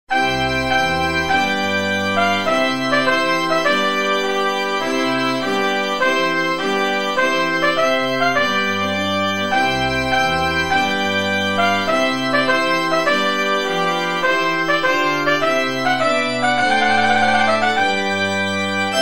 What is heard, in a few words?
trumpet tune; enebakk; vigselsmusikk